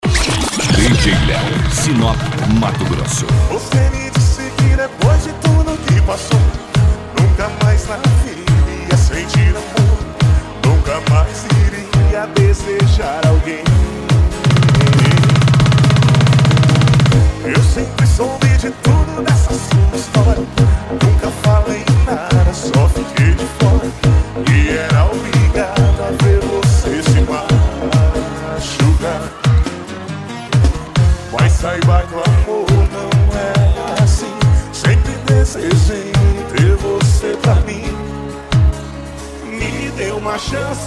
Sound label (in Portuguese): sertanejo remix e electro dance